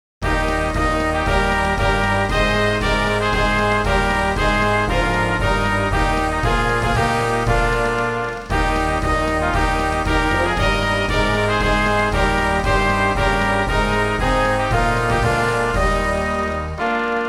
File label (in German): taiwan flaggenlied; hymnen; asien